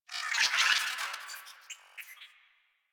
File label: Space Drone Attack; Warframe; Corpus Space Drone